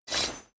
blade small pickup

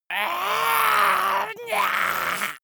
monster scream high

